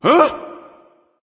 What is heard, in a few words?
surprise; smite; zeus